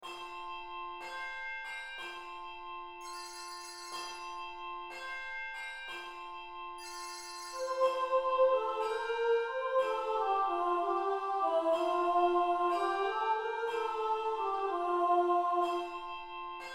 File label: On Christmas Night chimes